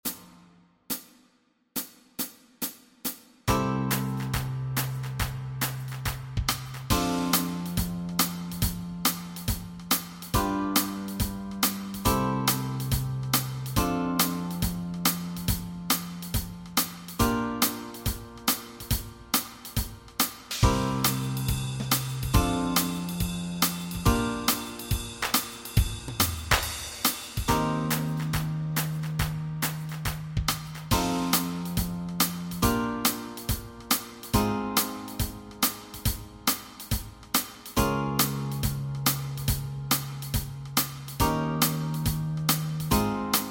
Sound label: Backing Track, walking bass